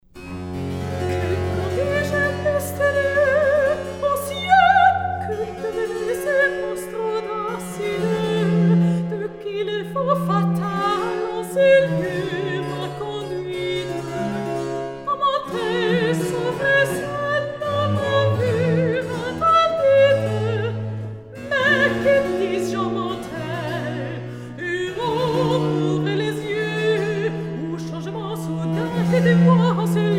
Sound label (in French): Recitatif; Jupiter et Europe